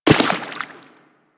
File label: Walk Water, Footsteps; Warframe; Operator